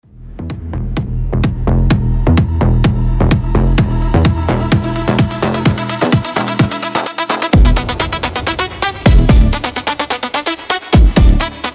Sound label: remix 128 bpm